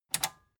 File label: metal button tiny click disable fast